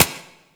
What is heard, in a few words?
Lasrian APC Turret Low; weapons; Warframe